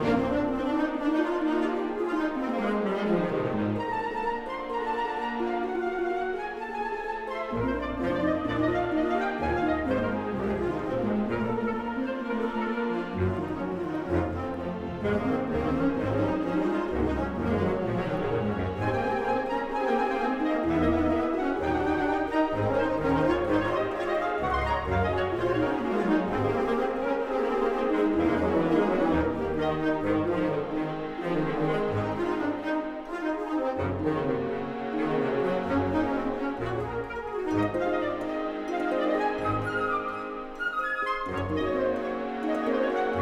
Comical 64bpm Loop; Music Dialogue

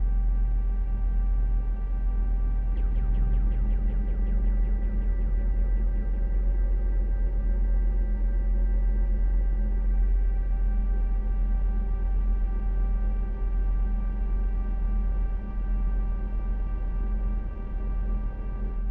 Room Tone

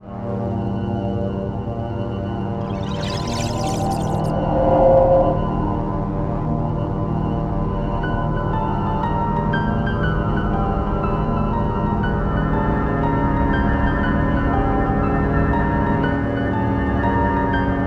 eerie premonition; Black Matrix 00

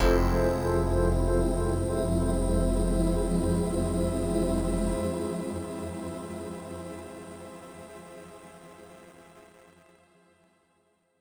Intro Drone; HeroExpress

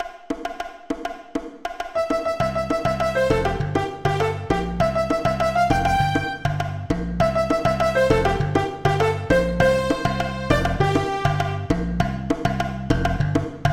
Selfish Citizen (General MIDI); sim city 2000; ms dos

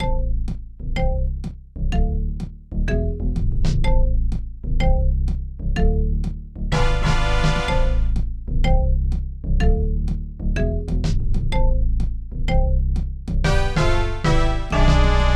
Continue; Yogi Bear; SNES

